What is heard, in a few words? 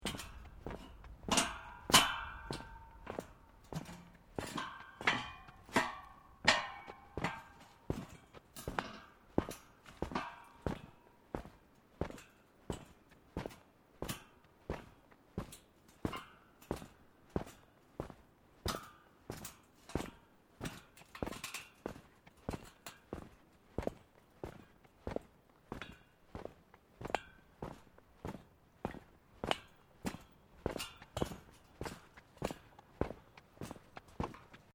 Footsteps Boots Grill Metal Sequence